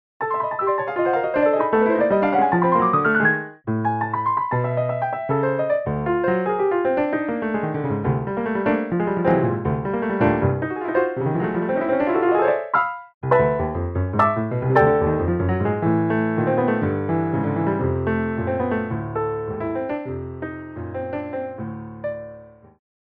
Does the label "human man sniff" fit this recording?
No